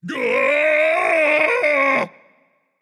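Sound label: non-verbal fear; smite; atlas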